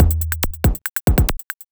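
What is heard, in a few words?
DRUMLOOP ELECTRO 140; Techno2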